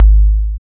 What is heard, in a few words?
BD, Synth; Sound & Vision - Gigapack II CD 2